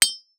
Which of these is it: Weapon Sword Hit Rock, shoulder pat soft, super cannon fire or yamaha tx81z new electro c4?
Weapon Sword Hit Rock